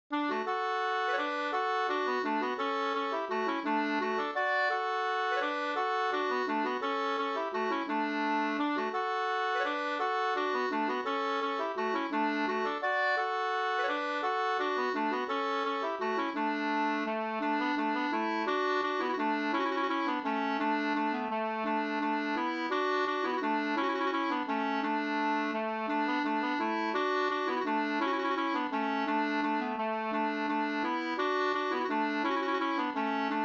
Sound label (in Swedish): vals, D dur; Sorunda